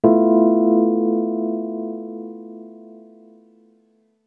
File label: D&B Keys Stab; neuro